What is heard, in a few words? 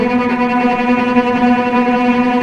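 cello B3 tremolo; East West; The Ultimate String Collection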